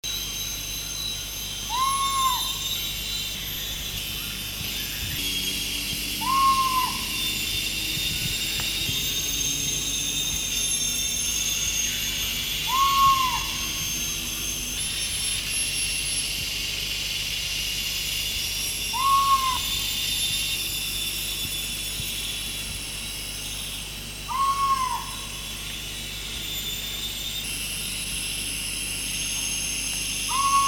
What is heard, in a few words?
Bubo sumatranus, Maleise oehoe; uilen; Strigidae